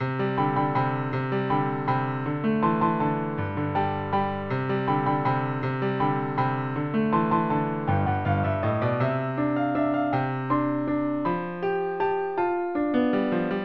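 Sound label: Piano, Bubbly Clouds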